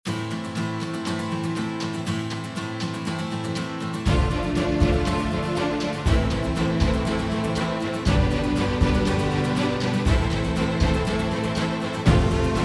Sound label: Fight Theme